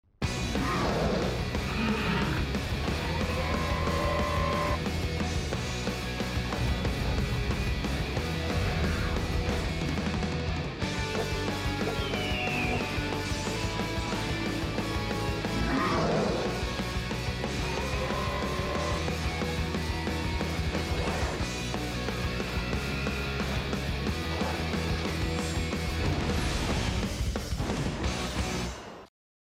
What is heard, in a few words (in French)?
musique generique fin